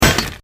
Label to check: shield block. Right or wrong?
Right